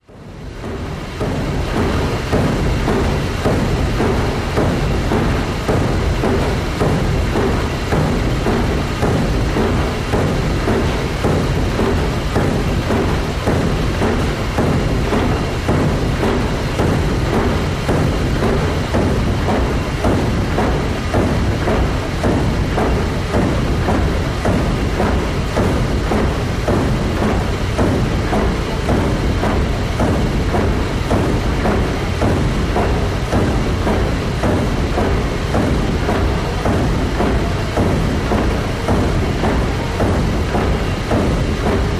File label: WWII Liberty steamship engine churns full speed in engine room.; Boat, Steamship Engine, Steamship